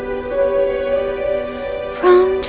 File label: female laugh